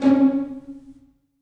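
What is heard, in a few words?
1st violins pizzicato c#4; Sonatina Symphonic Orchestra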